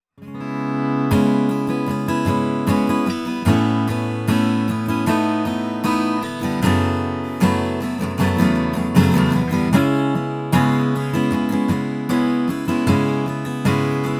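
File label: bourgeois jomc deluxe brazilian rosewood, guitars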